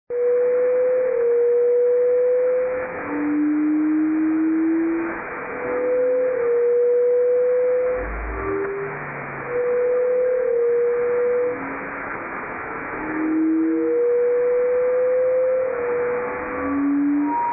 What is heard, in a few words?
whale sound